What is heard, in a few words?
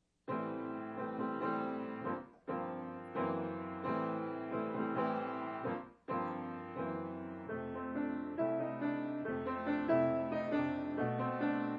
piano